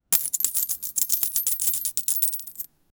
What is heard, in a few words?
metallic sound effect of, money